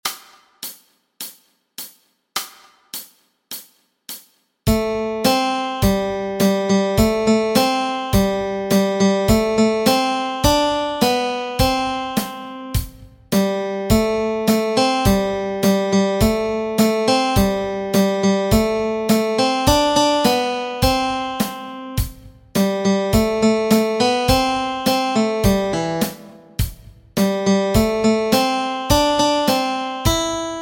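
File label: melody, Easy Guitar and Ukulele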